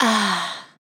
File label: vocal one shot ahhhh